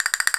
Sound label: Castanet; Planet Earth; Digital Sound Factory Planet Earth